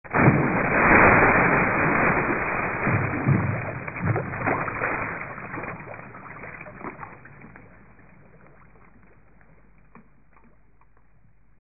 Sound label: teacher fall sound